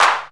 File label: clap